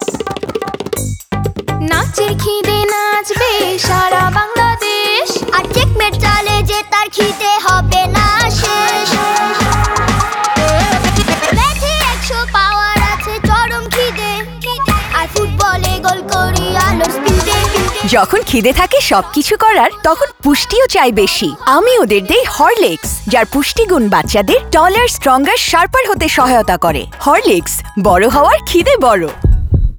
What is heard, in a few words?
TVC Jingle; Standard Horlicks